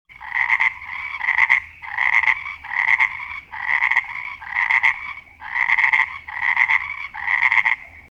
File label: frog croaking